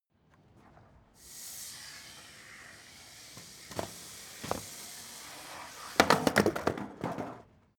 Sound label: skateboard indoors slide drop; sport freizeit